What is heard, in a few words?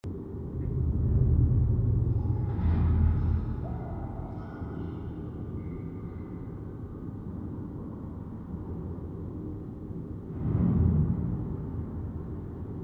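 Ambience Sewer Tunnel Drone Breath Loop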